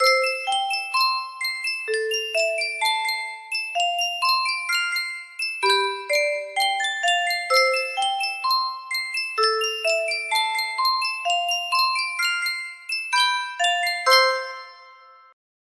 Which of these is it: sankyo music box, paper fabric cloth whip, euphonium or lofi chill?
sankyo music box